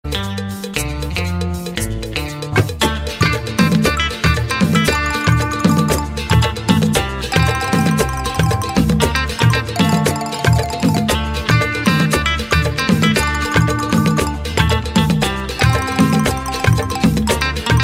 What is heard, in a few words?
melody ringtone